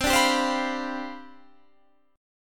C Major 7th Suspended 2nd Suspended 4th C down Guitar Half Step Down, chord